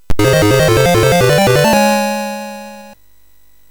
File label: Slap Fight, MOS6581R3; hvsc